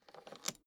suitcase open latch lock

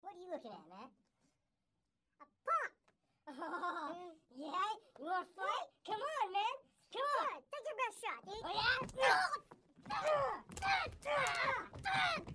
schoolboys brawl

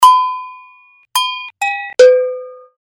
cow bells

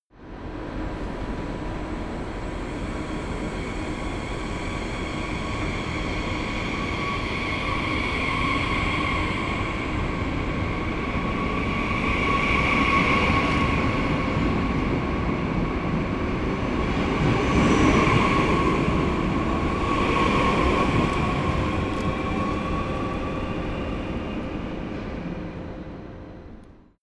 train regional train depart

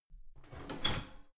garbage can open lid hard squeak

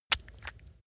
leather impact mud medium

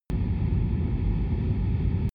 drone spaceship rumble

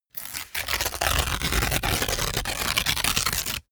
sheet crumpled tear up slow

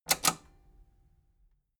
metal button robust spring click disable fast

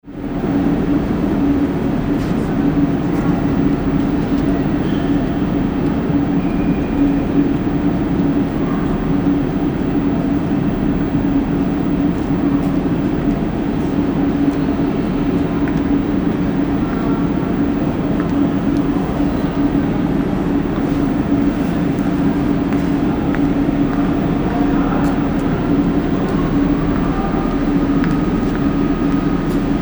railway station atmo

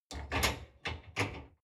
dishwasher frame fold up

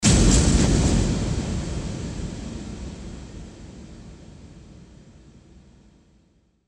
ambient noisy ambient impact

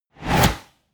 swoosh

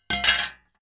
metal plate thin drop

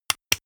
switch

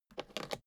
suitcase open latch lock fast

